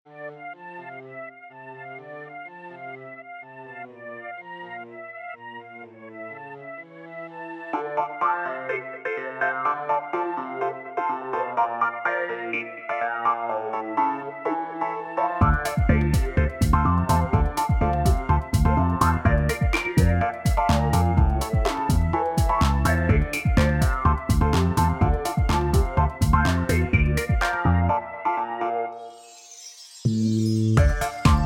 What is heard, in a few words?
Clavinet